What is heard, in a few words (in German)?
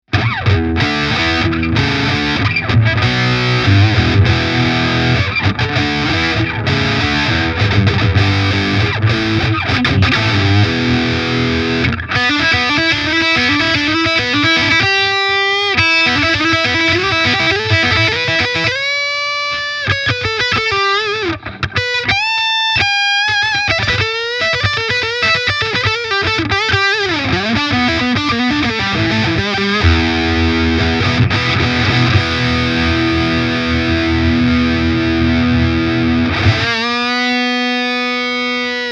Harley Benton SC Custom III Active Steg mehr Verzerrung